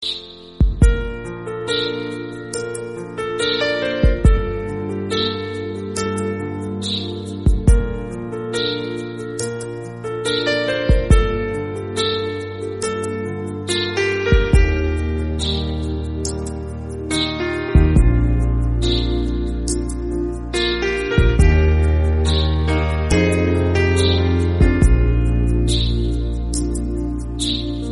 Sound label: beautiful romantic bgm